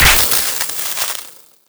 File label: spark sparkles